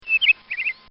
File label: Canary Trills; Birds; Animals; Bobby Cole